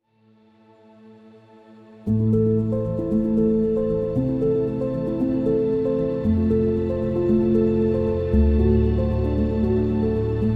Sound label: background documentary piano